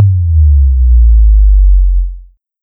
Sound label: Kick Long Sub